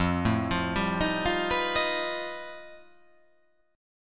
F 9th Flat 5th F down

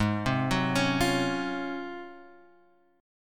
G sharp 13th G sharp, down

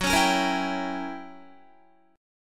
Gb 7th Flat 9th Gb Guitar; 13,16,13,15,16,15; Listen to Gb7b9 strummed

